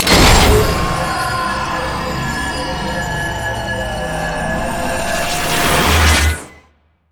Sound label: lantern; LoL; thresh